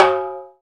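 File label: PER PROCU, Procussion, percussion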